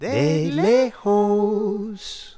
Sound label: mixture, singing librispeech; MedleyVox